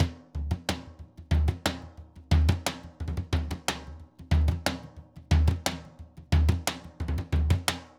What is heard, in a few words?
Surdo 120; Best Service; Festa Latina VOL-1; Drums & Percussion